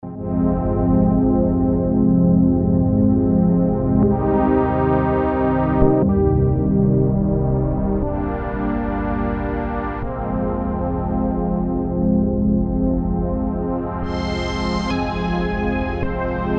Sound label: Stereo Strings; Strobe PD